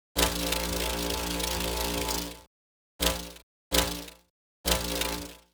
Electric Ground Woosh Flow; Wakerone; Electric Deluxe

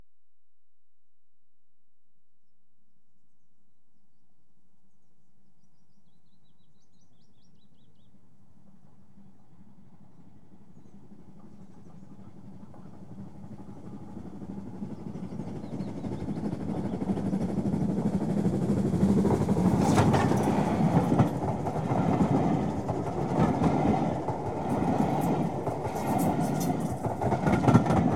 Train Passes High Speed Without W; BBC Sound Effects Library; Age Of Steam

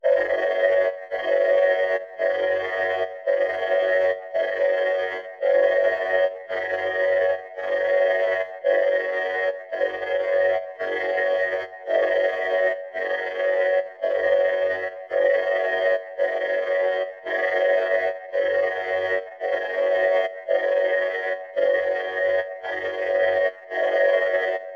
SPACESHIP INTERIORS ALARM; Filmnoise Inc

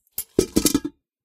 set Down, Helmet; Kenney's Sound Pack; Foley Sounds

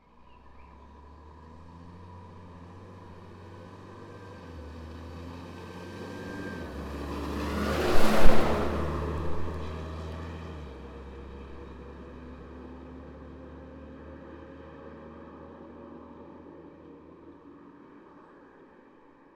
Volvo F12 truck exterior medium passby; 1992; DPA4021; Pole Position

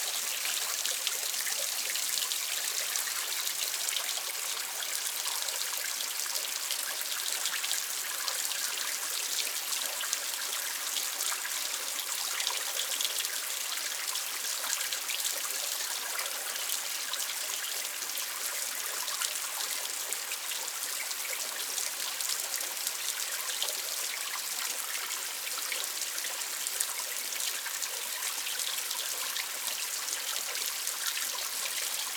River heavy dripping from wall of rocks; Dizzy Duck; Sounds From Nature

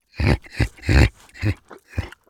Pony Nicker Soft; Jack Powell; Shetland Pony Vox

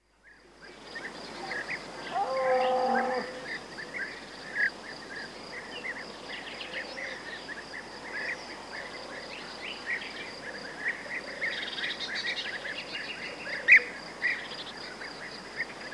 Cultivated Land (Warm Temperate); Rural Soundscapes; BBC Sound Effects Library

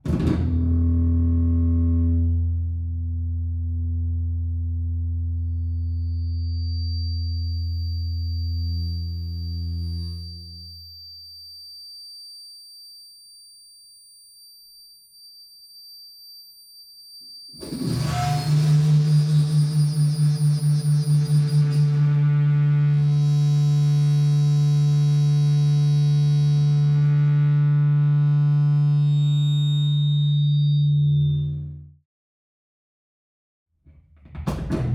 Feedback Long Tone Various Front ORTF; Pole Position

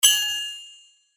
Sword Sound; FXHome; Fighting Sounds

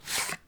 card Take Out Package, Casino; Kenney's Sound Pack

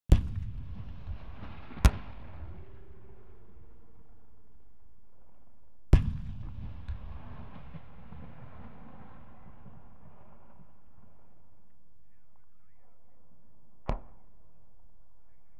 Warfare, Chieftain, Firing Hesh; BBC Sound Effects Library; Explosions Guns Alarms

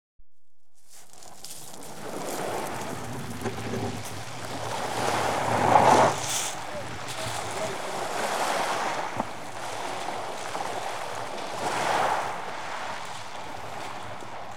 Board Launched From Gravel Beach; BBC Sound Effects Library; Adventure Sports

